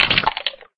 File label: Beast Guts Door Open